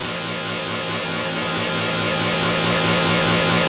Glitch; Energize

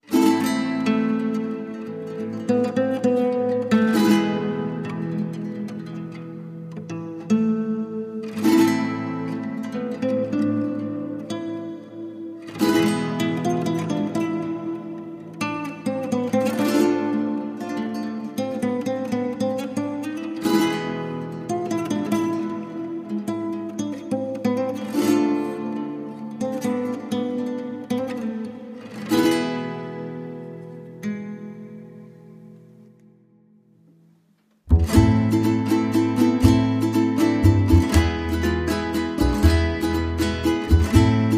No Perc

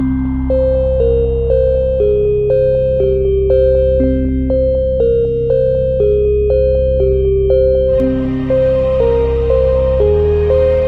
Dark Suspense, No Percussion

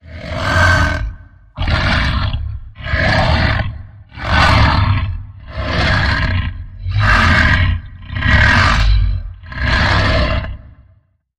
Troll Breathing Grunts

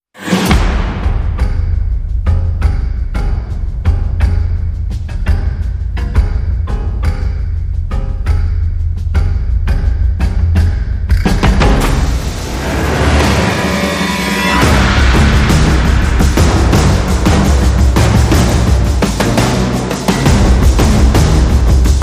Tribal Fighting

